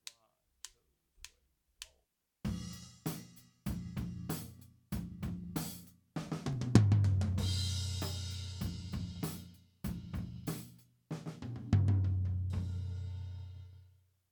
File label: Comp Ltom; Drum Recording Setup